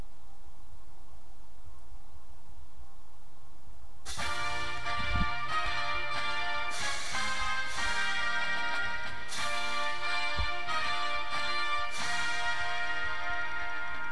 cymbals with music

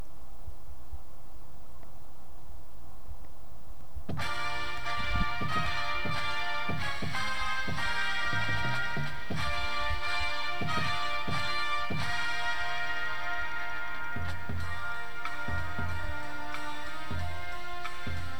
bass with music, drumline

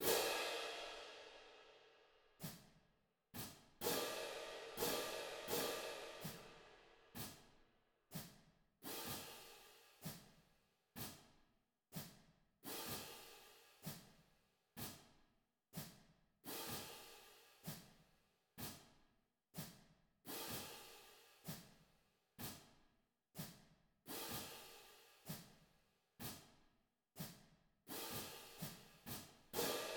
cymbals, drumline

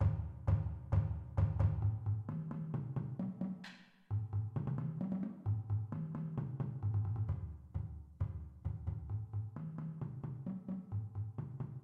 bass

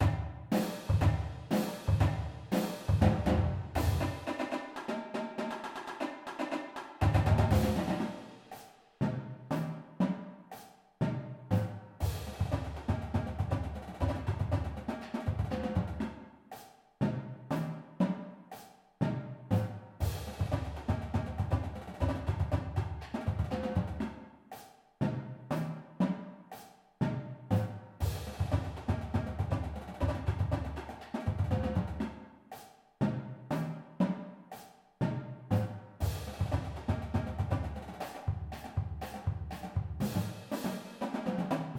full drumline

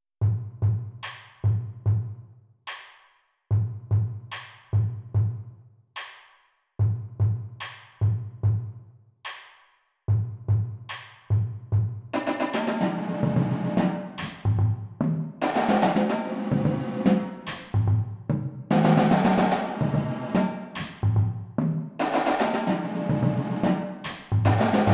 full drumline

